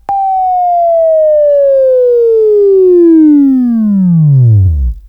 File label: Buzz; Labview-Program; Sweeping Training program